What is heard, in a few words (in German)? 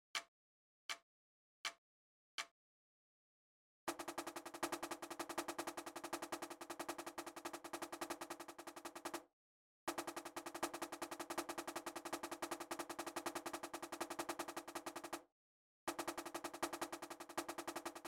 Rhythmus; Steiger-Marsch